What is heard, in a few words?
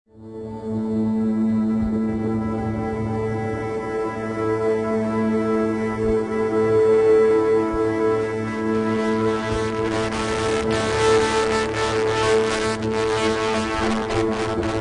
glass organ